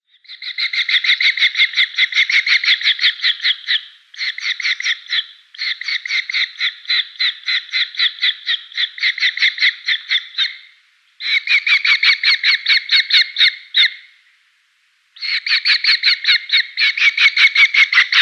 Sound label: Red-footed Falcon nestling